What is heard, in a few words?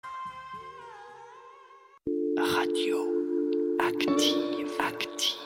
Active Jingle; Radio Active